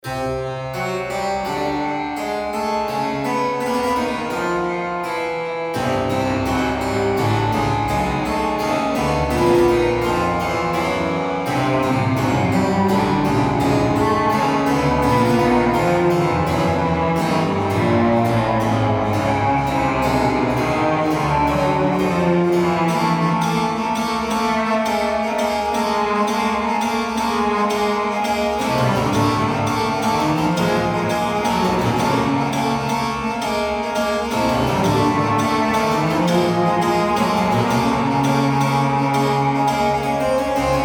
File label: haunted harpsichord